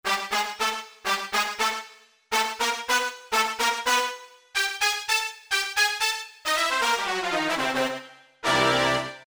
Fiesta Bras, Generalmusic Equinox

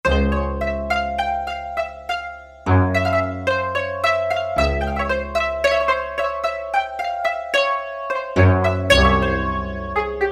Ethnic Ensemble; Roland SC-880; Factory Patches

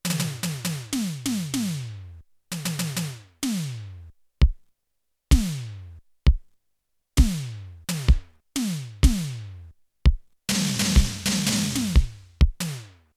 KIT FIVE, Electronic Drums, Yamaha TX16W

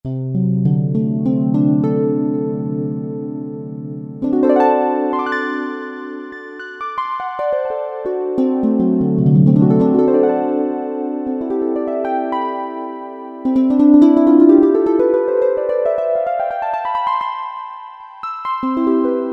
Roland V-Synth Digi Harp; Factory Patches

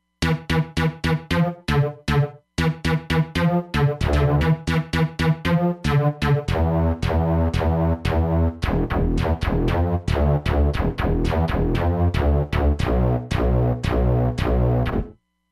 Filament, Roland Fantom XR

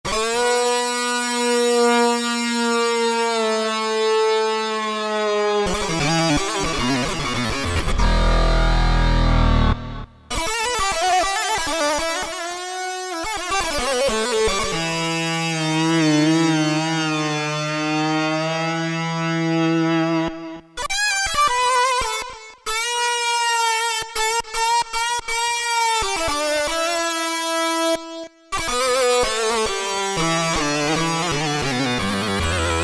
Dis. GT, Guitar; Korg DSS-1

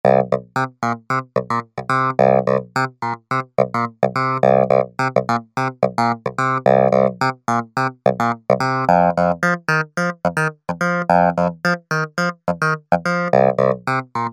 Smokey Clav; PIANOS and KEYBOARDS; Apple GarageBand 2

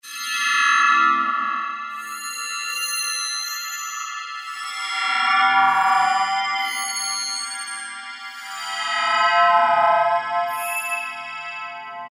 Wine Gls; Generalmusic S2R

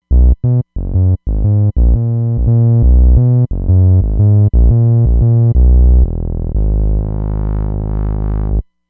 Virtual RnBs, Roland Fantom XR

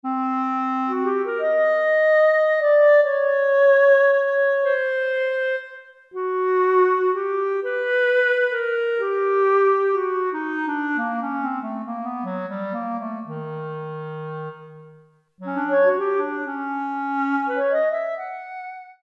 Clarinet, Roland SR-JV80-01 Pop

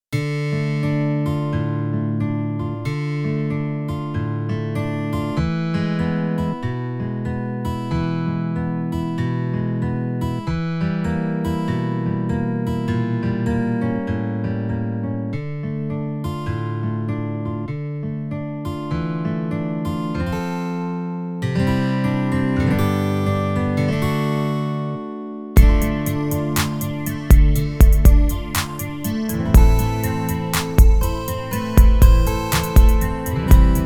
A Guitar, Korg Radias-R

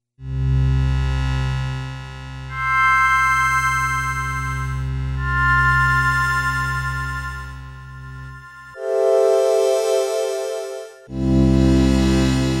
Miracle, Tones

